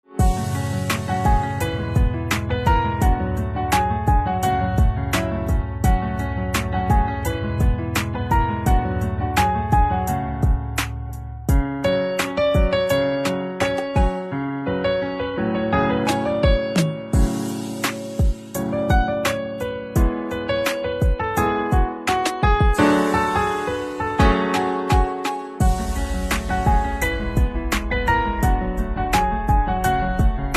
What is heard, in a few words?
Piano Instrumental Ringtone; devotional instrumental ringtone